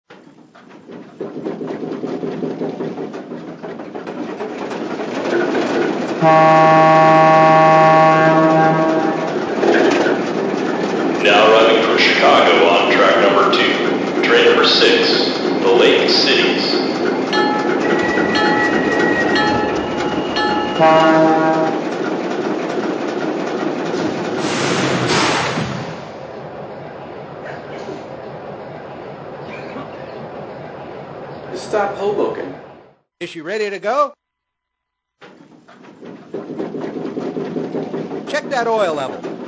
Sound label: Alco PA